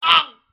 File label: hurt